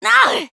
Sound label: auugh